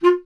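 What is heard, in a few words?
wind bass clarinet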